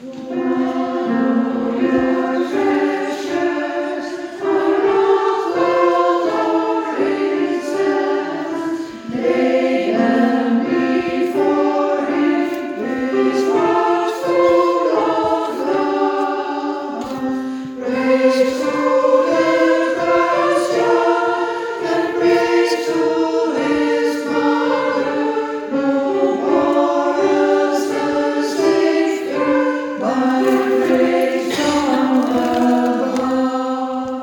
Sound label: Christmas Lullaby Sopraan Alt